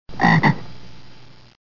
grenouille

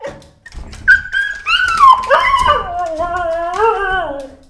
chien

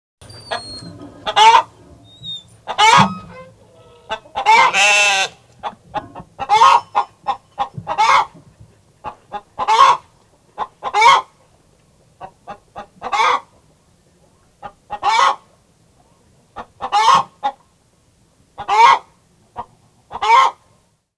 poule

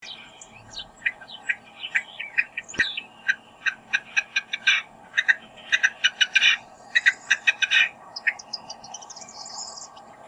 Chant d oiseau

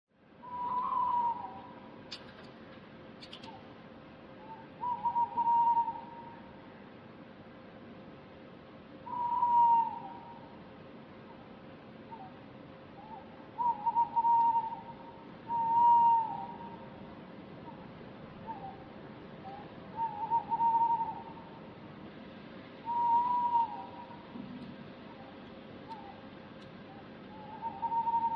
Bruitages de chouette; Sound Fishing

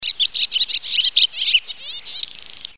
hirondelle

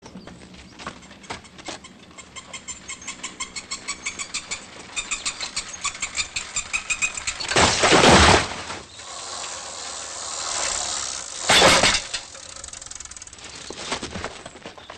bruitage grincements de velo et chute

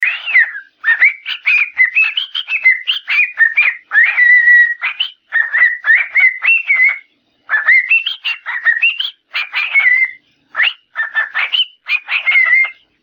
Chants et sons oiseaux

